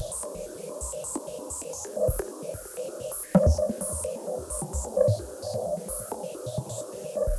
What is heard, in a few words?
Moving Noise 130, Tempo Loops 130bpm